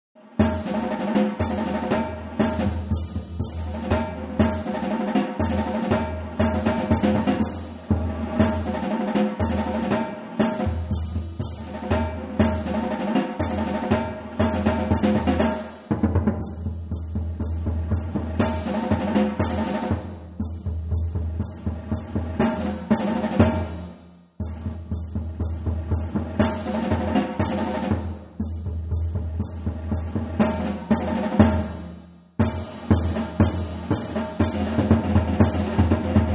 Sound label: sound of the drums